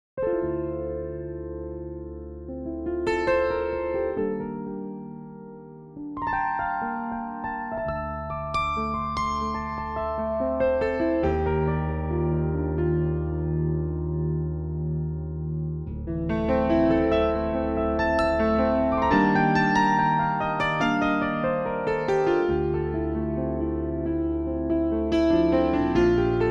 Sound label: CP-80 Slow Stereo Tremolo